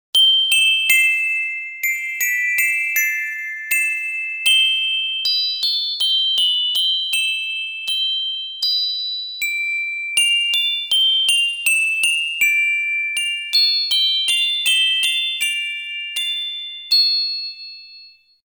feines kleines Glockenspiel

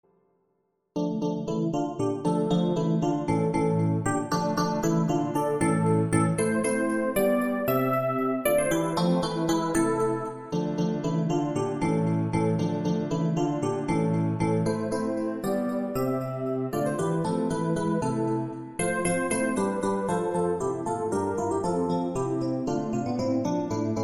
der elektronische

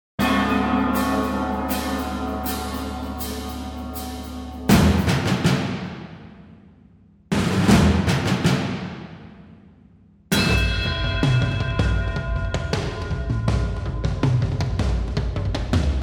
Trommel Tusch

